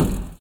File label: roof step; Golden Crayon Sounds